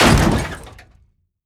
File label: Car Damage Small